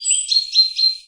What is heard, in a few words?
Birdsong Bit, Oxford Island Lough Neagh